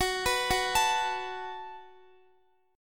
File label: Gb Minor Double Flat 5th, Guitar; Gbmbb5 arpeggiated; x,x,18,16,18,17; 4F,4A,5C,5G,5C,6E